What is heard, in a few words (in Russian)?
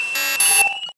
kosmicheskij signal svyazi